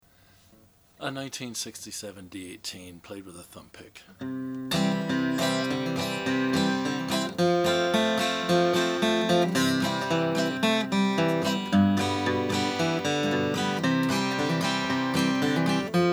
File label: martin d 18 natural